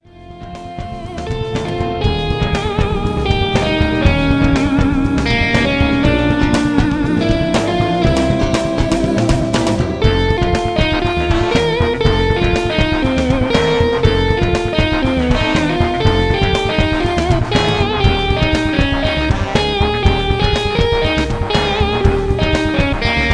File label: funk rock